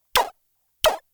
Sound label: electronic beeps single blurty tone blats; prosoundfx